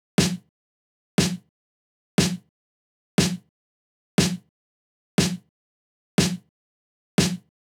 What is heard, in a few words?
Snare, Nu Disco; Zero-G; Construction Kits; Legacy